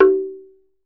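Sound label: Tongue Drums, high; E-MU Producer Series Vol. 7 – Old World Instruments; Pitched Percussn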